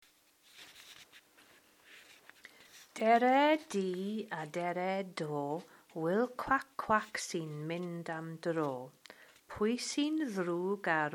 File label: solo